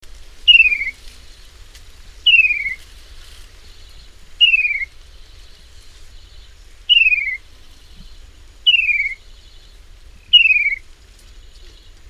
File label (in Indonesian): kaso kaso